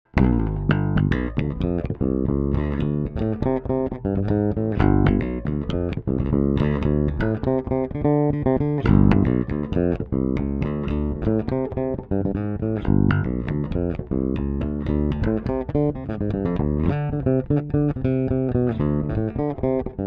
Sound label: Bridge Passive Treble Slightly Cut